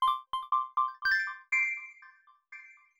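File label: granular bell accent C major; accents; bespoke - Floral v.2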